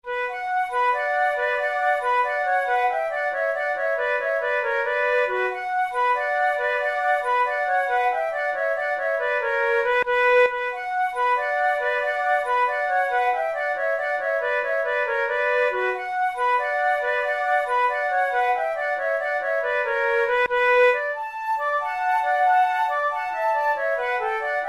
gigue, flute

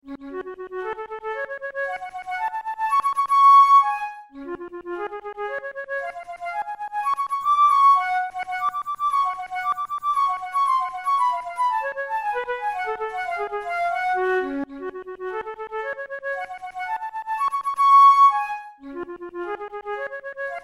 melodic exercises, flute tunes

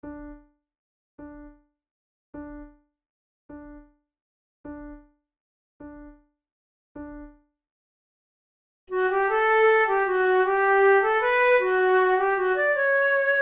duet; my ain fireside

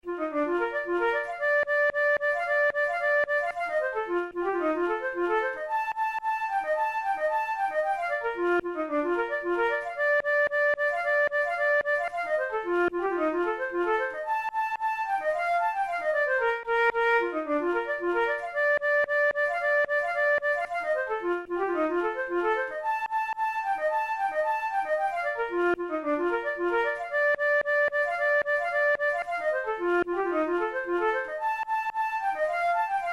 clarinet polka

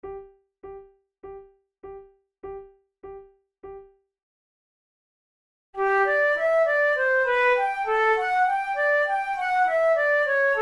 allemande, flute

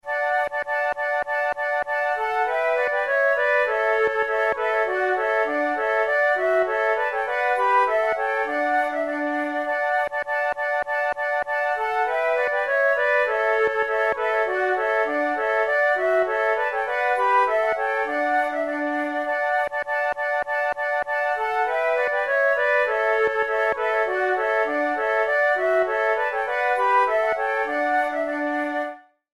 bells trio

